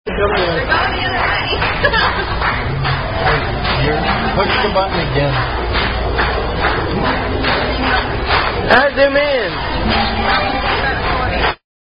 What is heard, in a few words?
Birthday Dinner, Happy Birthday (Tune Sound Off); 21st; At Red Robin